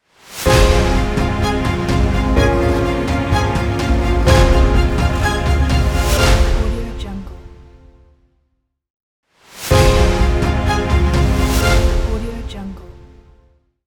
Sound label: logo epic